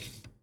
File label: floppy sound fdd5 motor end